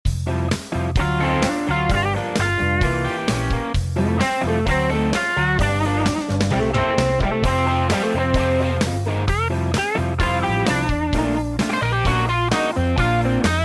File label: Blues